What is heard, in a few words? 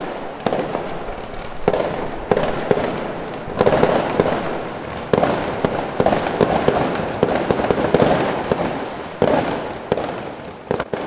fireworks on new years eve